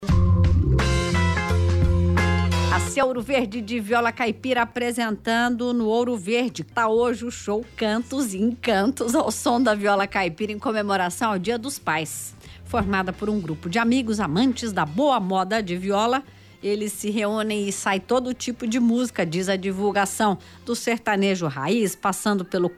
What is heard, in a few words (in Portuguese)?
VIOLA CAIPIRA